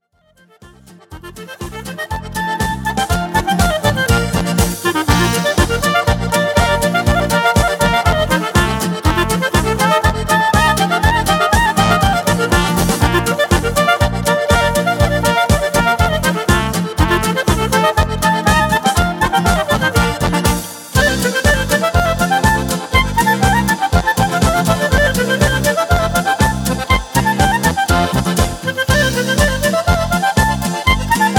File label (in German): Klarinetten